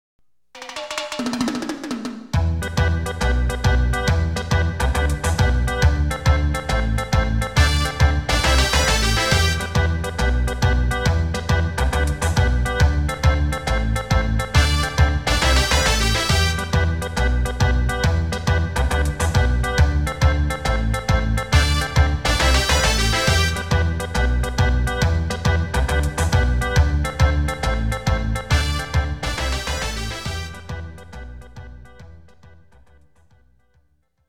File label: Ranking BGM